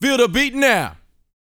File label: VOX F, Vocal Planet R&B & Dance HIP-HOP; ILIO